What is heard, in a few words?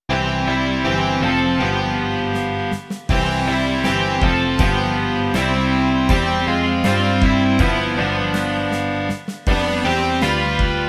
rock